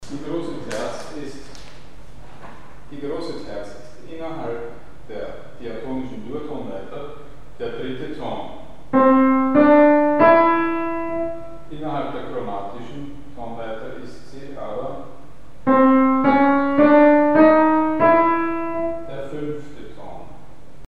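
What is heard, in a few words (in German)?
Große Terz ist chromatisch 5. Ton; Mikrobuch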